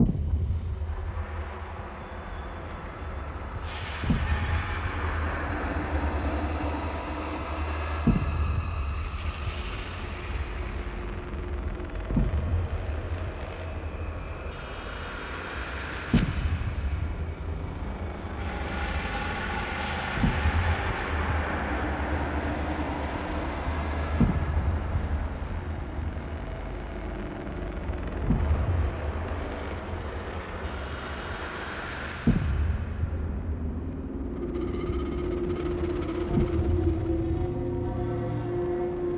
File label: Enrage Drone; The Town Levels 4 6; Devour 2021 gamerip pc macos